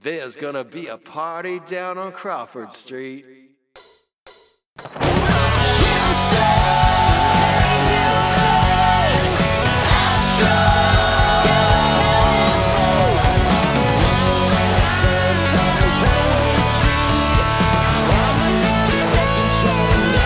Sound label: electric